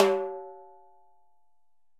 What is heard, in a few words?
Timbales A H Rim, Latin Percussion, Drum Hits; Ableton; Factory Packs